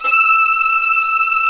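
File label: strings, Vlns 8 fff Agitato; Roland LCDP13 String Sections; STR Vls8 Agitato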